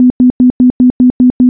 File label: Car Warnings; oviatt